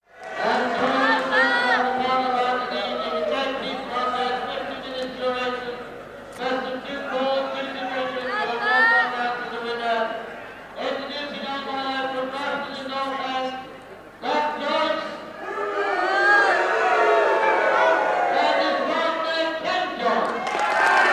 Tag Match, Tag match - PA announcement with crowd reaction, bell, bout begins; BBC